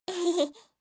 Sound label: "ehehe"; kanjou